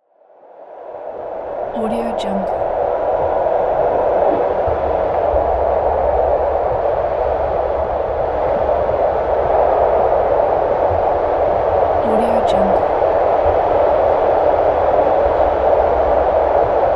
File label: cold static wind; lunamycreations